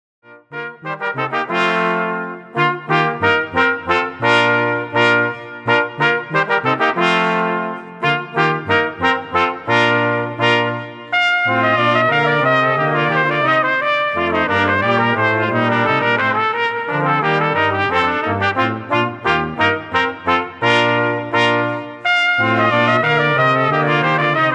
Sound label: ding dong merrily, brass band